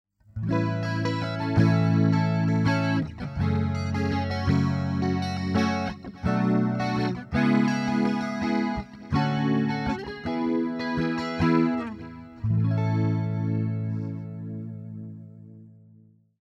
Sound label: Flanger